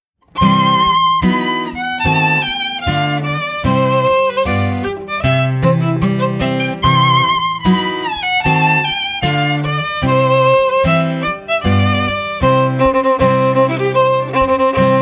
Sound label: wedding march